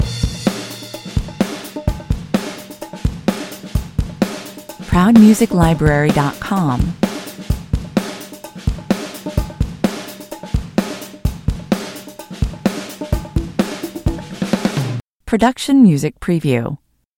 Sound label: loop rhythm group